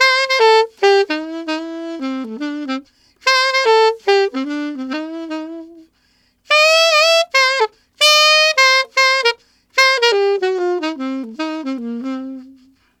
Alto Shuffle in C7, Sax; Sonic Foundry (Sony Creative Software)

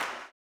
CLAP, Blues Soul; Spectrasonics Vocal Planet

